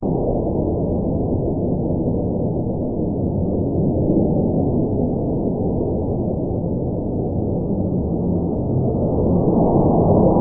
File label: storm for sleeping